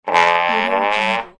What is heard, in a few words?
cartoon comedy trombone